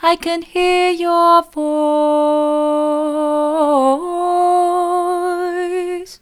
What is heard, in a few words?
i can hear your voice soft, vocal